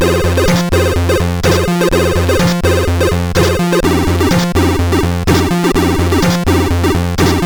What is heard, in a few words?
Flappy Typing, CSG8580R5